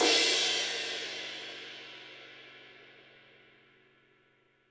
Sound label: HiHat & Crash, Roland TD-20; HiHats